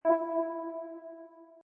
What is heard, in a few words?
Musical, Button sound or menu sound; Multimedia